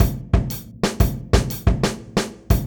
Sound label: Groove Pizza 90bpm